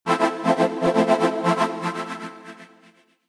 alert soulstice ringtone

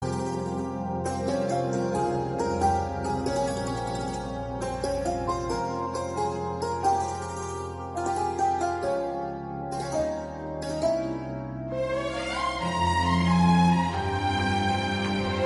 srilankan ringtone

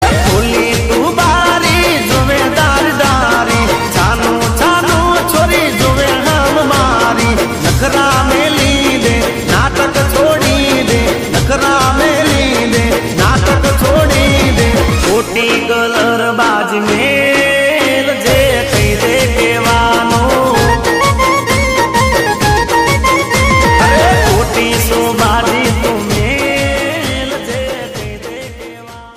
gujrati ringtone

